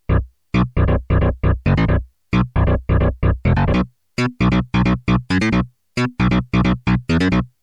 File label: tx bass, synth